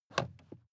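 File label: sports car interior pedal clutch release; verkehr transport; autos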